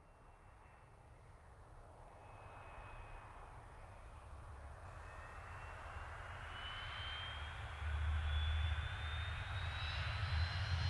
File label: MiG15UTI ext landing 30m; sonniss gdc